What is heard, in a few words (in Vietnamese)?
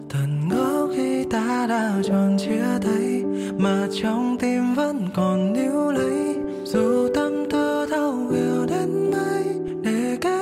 Piano Ver